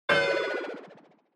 Onoma Negative Short; オノマトペ ネガティブ02-1(短); 効果音＞オノマトペ・ネガティブ

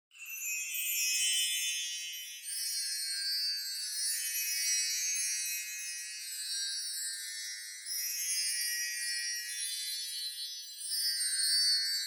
Wind Chimes Reverb Long

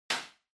Party Popper Far

